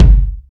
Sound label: verby kick single hit g key